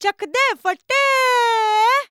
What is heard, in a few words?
VOICE, EASTERN; ILIO; Vocal Planet